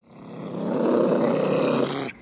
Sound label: Pet Cat Growl; creatures pets